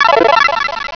BONUS

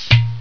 POP

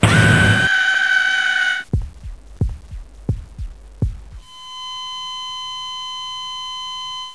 die

